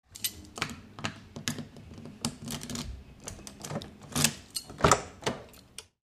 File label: car door open close